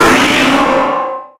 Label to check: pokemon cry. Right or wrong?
Right